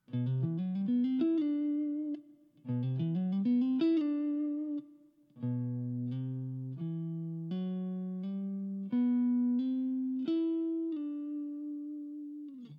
Lydian Lick, guitar

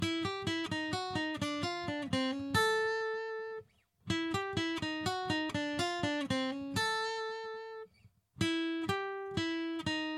Blues lick, guitar

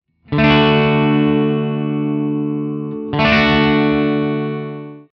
D Chord, guitar

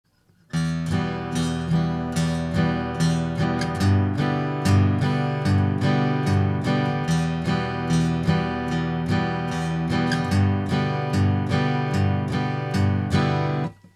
strumming, guitar

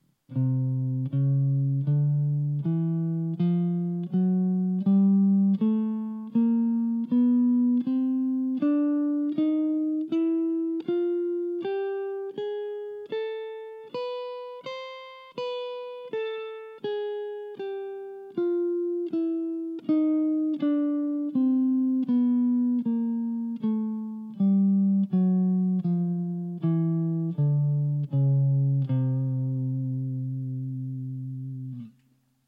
C Major Chromatic, guitar

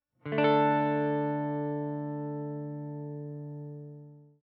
D power chord, guitar

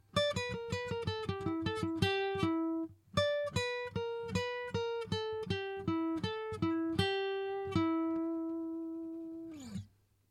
Blues lick, guitar

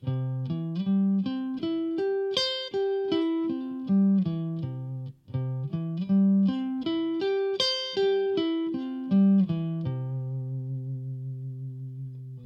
C Major Arp, guitar

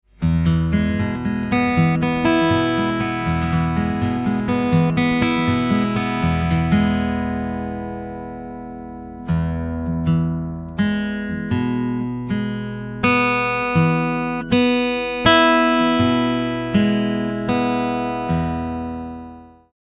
Thumb first finger 2nd exercise, guitar

